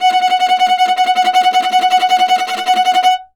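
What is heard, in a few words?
viola tremolo F#4; Orchestral; DirectWave; Varazdin Orchestral; Viola II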